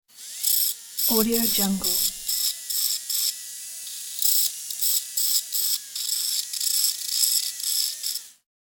dentist drill drilling tooth; urban sounds

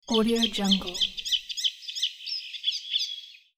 icterine warbler phrase; nature sounds; پرنده در یک روز بهاری

